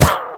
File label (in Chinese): 女巫受伤声